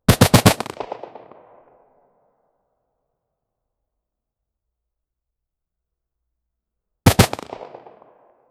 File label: realistic ak 47 gunfire